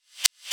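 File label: clap reverb, drum machines; 300 Drum Machines; E-MU Orbit-3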